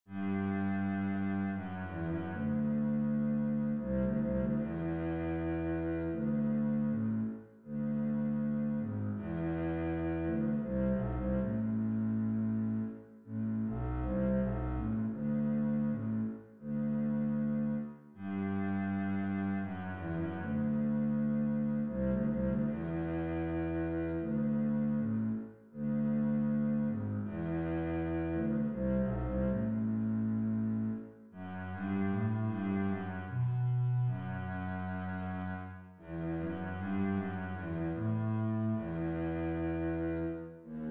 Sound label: bass, string bass; nocturne